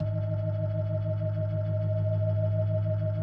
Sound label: B3, First Call Vintage Keyboards